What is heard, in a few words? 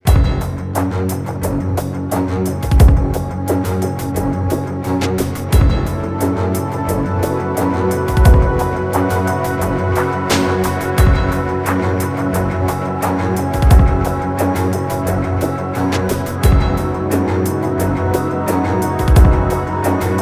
Warning; AI The Somnium Files Nirvana Initiative